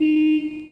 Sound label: horn; Mafie 1